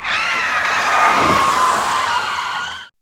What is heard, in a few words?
skid, Cars; CosmicRage